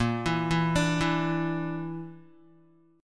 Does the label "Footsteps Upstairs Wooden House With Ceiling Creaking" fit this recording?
No